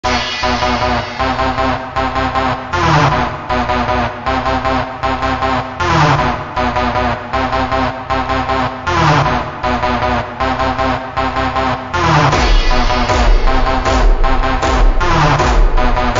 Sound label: ultra slowed reverb